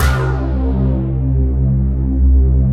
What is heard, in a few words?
METALLICS; Pro-Rec - Synthsonic; TRINITY